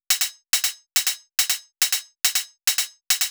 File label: drum Loop 140BPM HH